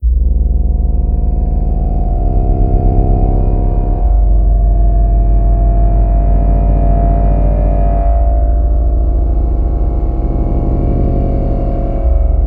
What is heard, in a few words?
Ambient; Clandestiny of Elsie; Scythuz